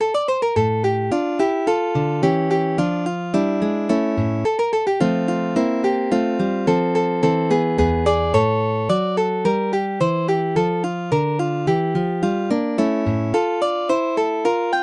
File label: barrios prelude in d minor, guitar